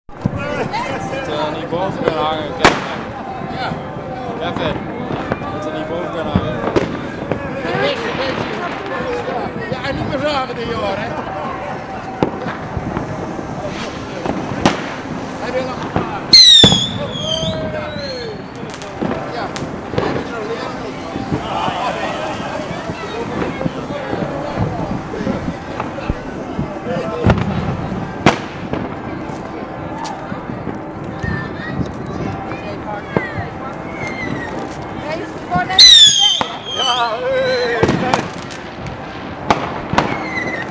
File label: Geldrop new year, field recording; Gijs Gieskes